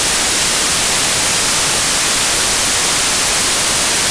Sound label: White Noise; Ableton Live 9 Essential Training